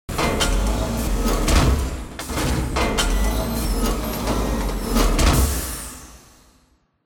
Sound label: claw grab